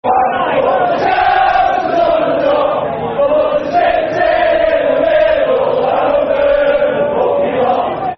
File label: Build A Bonfire Football Chant (Soccer Song); Man United